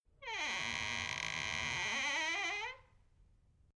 Household Door Old Wooden Door Hinge Sound Squeak Eerie